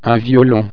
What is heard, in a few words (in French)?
violon